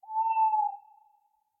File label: Tawny Owl; SyrinScape